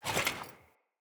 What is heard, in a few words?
Foley Action Armour Jump; Player; Deora Armour